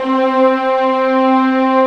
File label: ORCH C4; ORCHE#2